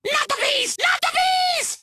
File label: Angry Bees; Warmonger Archer